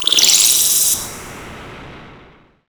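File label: synth, Production Effects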